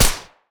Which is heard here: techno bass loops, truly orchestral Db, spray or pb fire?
pb fire